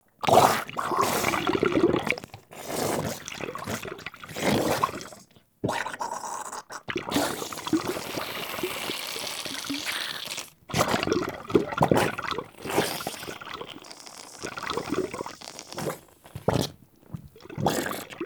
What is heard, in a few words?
Infested Goo Pond Spash Loop; Ambience; Props; Warframe; Infested Eris